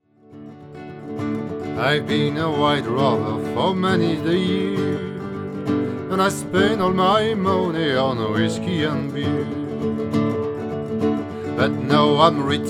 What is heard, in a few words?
Irish Traditionnel Wild Rover